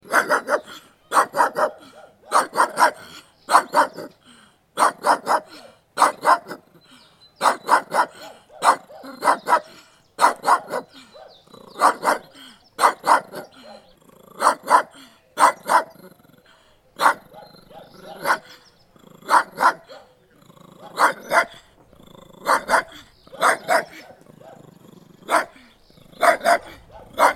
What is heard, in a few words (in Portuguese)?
som de cachorro pequeno latindo efeito sonoro